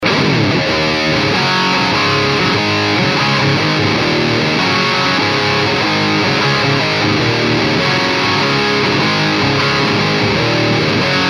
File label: Triple Giant Brown Channel